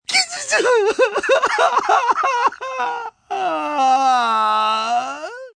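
Cry Loud Male, Cry, male, loud disappointment, pain, hurt, sad (human voice); Crying; Sound Effects:Voice; Voice Box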